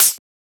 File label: Closed Hat